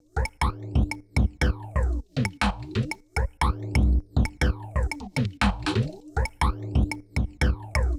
Loop 120, Perc Loops

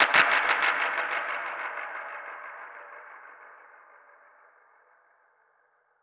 Perc Hit, dub percussion; musicradar

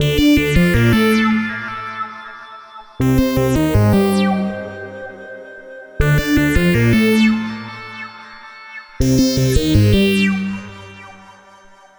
VA Synthesis, Keyboards; Sonic Foundry (Sony Creative Software); Leo Cavallo Bass Taster

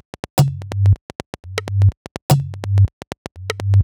Top C 125bpm, House Drumloops; 90 s Sample CDs; Zero-G House Fabrik Drumloops; ZG Drumloops

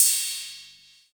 Ride, Drum & Bass, Hats & Rides

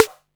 snare, techno 138 BPM

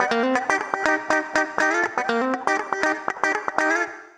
Acoustic Guitar Loops, 120; USB Soundscan vol.04 - Electric & Acoustic Guitar Loops; AKAI

